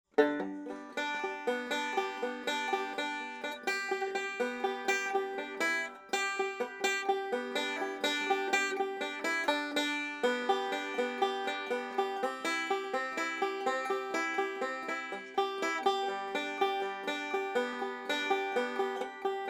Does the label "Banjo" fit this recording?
Yes